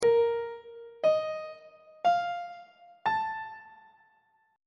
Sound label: b flat major 7th suspended 4th chord intervals on treble clef